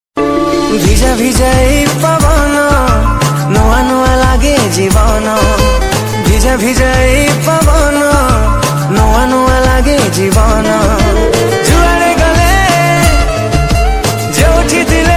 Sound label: Odia Ringtone